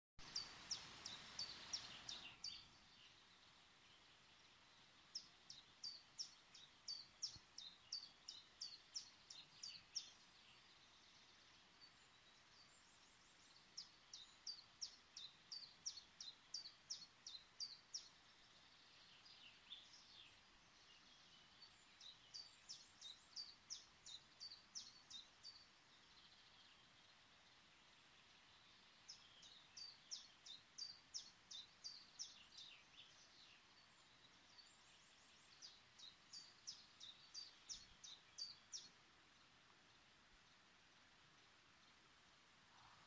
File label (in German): Wald Vögel